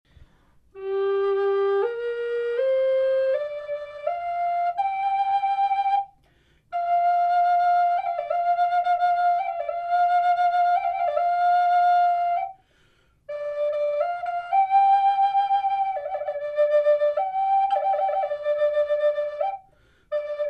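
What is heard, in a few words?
natural, flute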